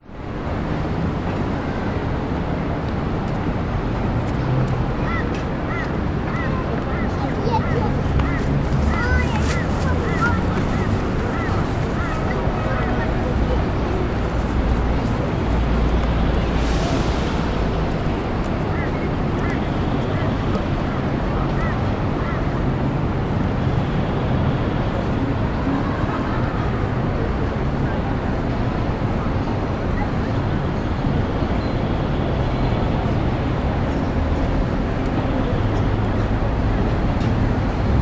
brisbane cbd reddacliff place morning quiet people distant city hum short ver